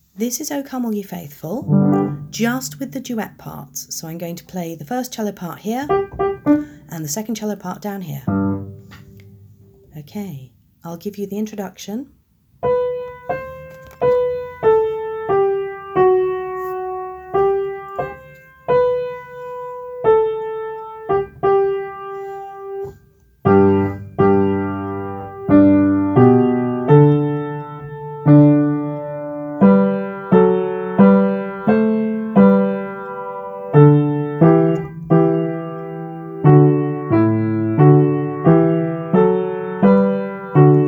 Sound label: o come duet parts together, cello